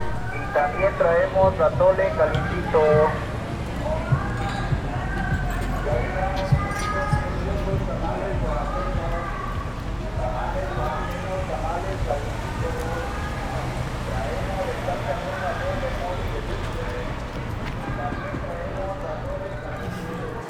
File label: CDMX Street vendors loop tapes